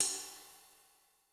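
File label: Sizzle Rock, Cymbal; Yamaha DTXpress-II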